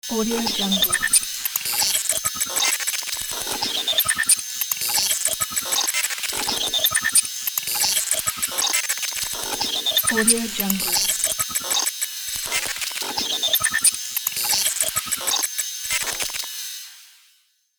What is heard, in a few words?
digital device sci fi